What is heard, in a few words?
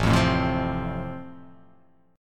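A Minor Sharp 5th, chord strum; Am#5 strummed; 5,8,7,5,5,7,x,x; JGuitar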